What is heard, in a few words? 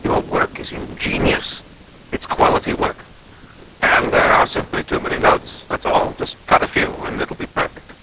lpc noise